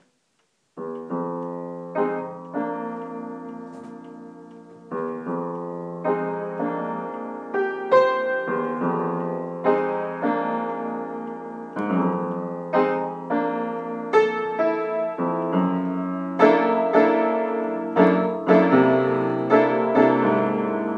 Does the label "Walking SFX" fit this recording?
No